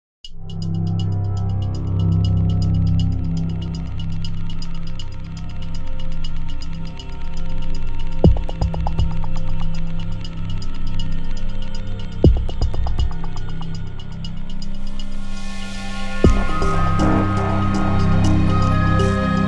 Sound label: wrong movement